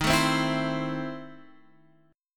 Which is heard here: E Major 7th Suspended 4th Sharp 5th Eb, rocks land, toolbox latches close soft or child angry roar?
E Major 7th Suspended 4th Sharp 5th Eb